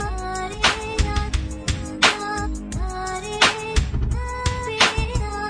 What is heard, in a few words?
088 arabian vox beat loop